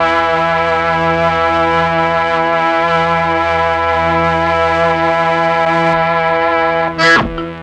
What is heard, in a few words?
MEL STR, Legendary M400; ILIO; Propeller Island